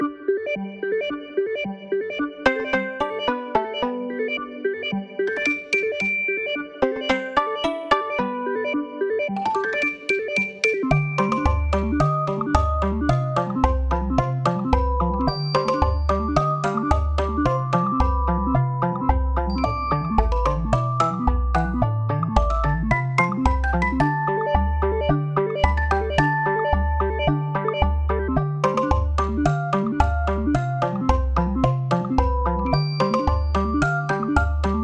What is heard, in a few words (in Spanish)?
musica; Emojiji